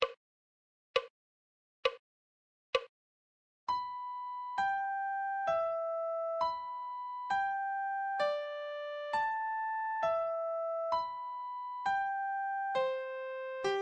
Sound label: musical reading in treble clef Adagio